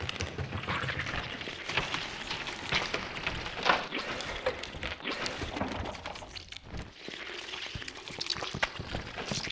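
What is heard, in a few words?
Swarm Underfloor loop; silent hill homecoming 2008 gamerip pc ps3 x360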